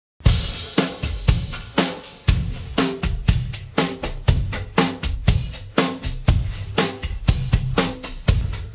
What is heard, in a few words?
drums pattern